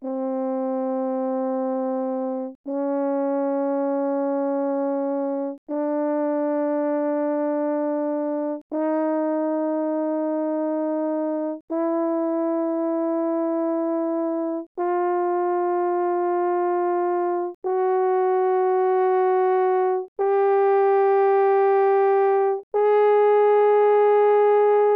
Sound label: Horn, mf, C4 B4, french horn; Brass